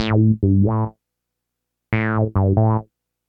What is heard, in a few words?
bass; flash tutorial